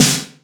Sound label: snappy steel snare drum sample D key; snare drum samples